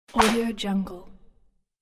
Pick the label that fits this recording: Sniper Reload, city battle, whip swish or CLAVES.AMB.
whip swish